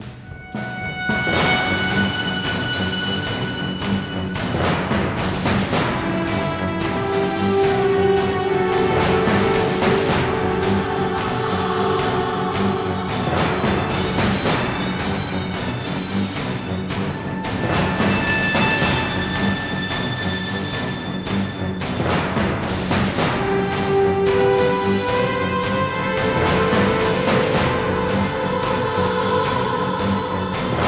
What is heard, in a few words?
Sicily Action